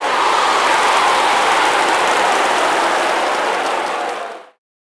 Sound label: Crowd Cheer; Pitch Black Audio Pong